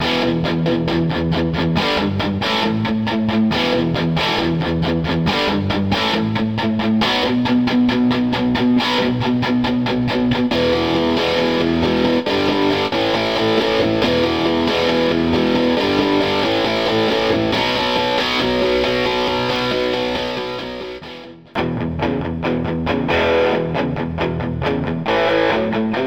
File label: rock live guitar; ketron audya